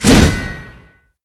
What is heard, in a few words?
swing horizontal